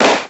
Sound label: one clap